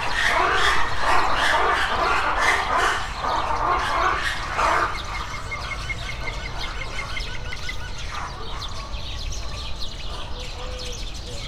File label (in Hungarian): hangoskodnak és a zöldküllő, vari; debreceni zoo 2012